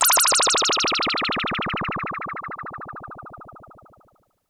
Prophet 5 FX; Greytsounds VOL-1

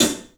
club hat; 3D Sound; 3D Drums

